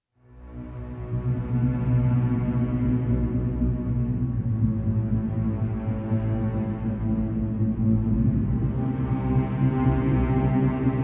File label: Foreboding Ambient